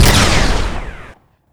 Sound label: railgun charged fire; weapons